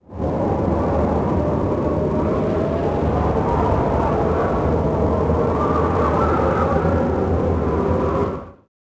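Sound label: wind heavy loop; Star Wars Obi Wan; XBOX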